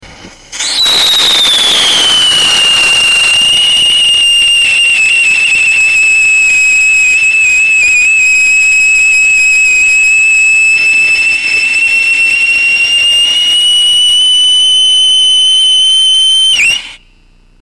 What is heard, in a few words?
zinc oxide with core, rockets whistle tests; Sodium Salicylate; Core Burner; Speed similar to no catalyst